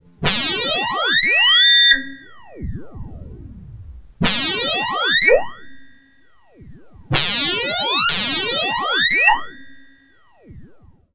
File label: Behringer Neutron FX FM LFO